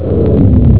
elevator down; ParadroidII

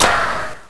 RICOCH; THEXDER

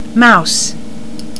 MOUSE

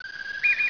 BIRD

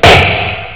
Kick

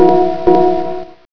DINK DINK

